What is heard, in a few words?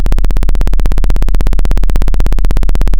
Sound of a plane taking off